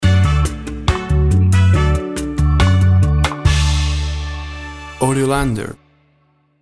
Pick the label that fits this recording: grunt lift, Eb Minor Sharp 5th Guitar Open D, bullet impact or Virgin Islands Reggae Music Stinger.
Virgin Islands Reggae Music Stinger